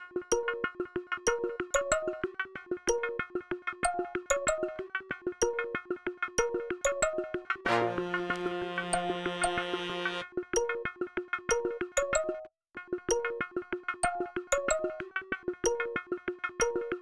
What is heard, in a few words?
Melody; Sonic Foundry (Sony Creative Software); Westside Underground